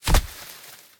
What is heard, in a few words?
Monkey